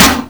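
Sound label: hit metal, small hits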